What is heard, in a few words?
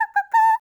YODEL, World Voices; ILIO; Vocal Planet VOL-5; BAVA. YODEL